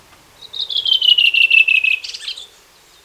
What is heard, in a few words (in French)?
grive mauvis